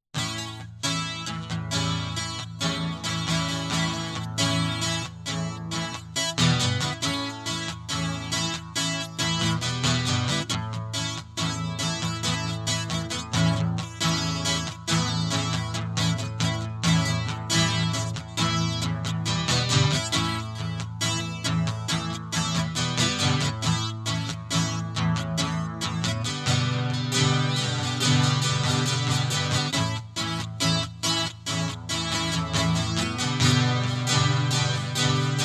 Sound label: Guitar